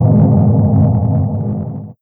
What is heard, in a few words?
rumble